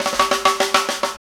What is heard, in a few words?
Fills, Roland; Sound & Vision - Gigapack I; Perc